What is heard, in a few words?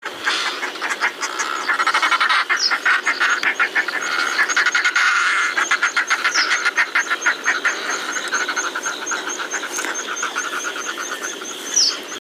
northern fulmar song